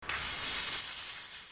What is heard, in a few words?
dog